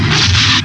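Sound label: POWER LASER; STARS FXS; East West; Global Sounds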